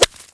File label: Bullet Stone, Impacts